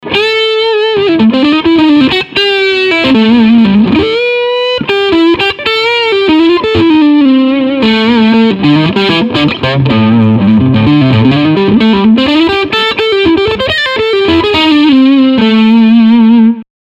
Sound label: Leadline Crunch